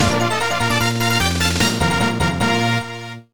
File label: Item Get Fanfare; Ganbare Goemon Toukai Douchuu Ooedo Tengurigaeshi no Maki; Nintendo DS